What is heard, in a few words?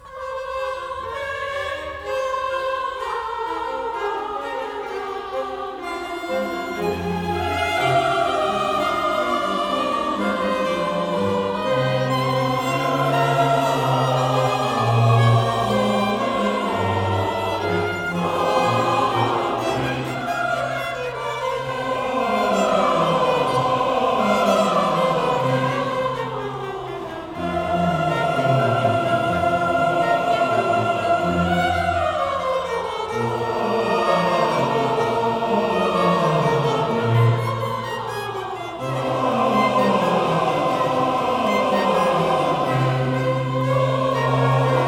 Stabat mater, Amen; Pergolesi; hiver baroque